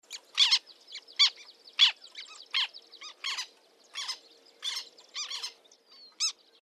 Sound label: TERN BLACK, birds; species/birds; wbba